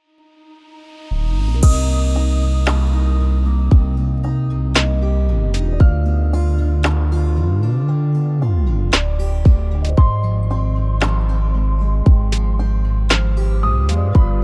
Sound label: beat